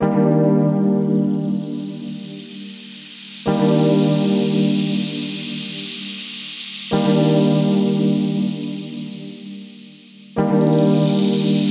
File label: Rain Stick Booty Clap